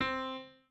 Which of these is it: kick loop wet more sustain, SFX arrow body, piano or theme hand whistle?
piano